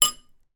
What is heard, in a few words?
household cutlery hit dish